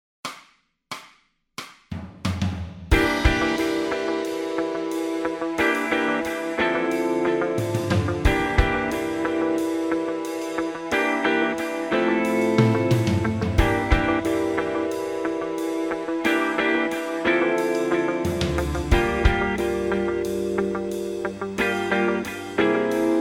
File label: bass modes, backing tracks